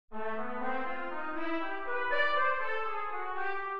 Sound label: Maj7 Inv0 C